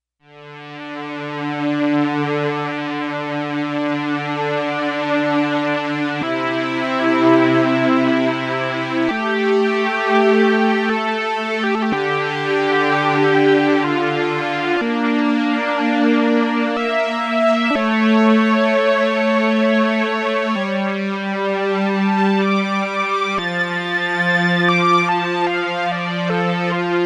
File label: ANA STRINGS; Digital Synthesizer